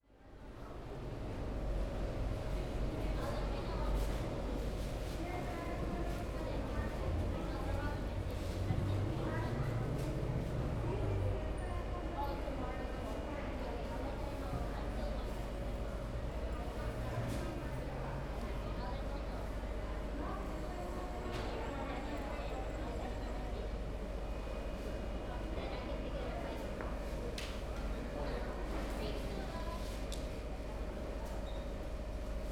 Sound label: Ambience, Market, Live; City Sounds